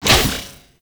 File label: Melee Thrust; Enemies; Warframe; Necramech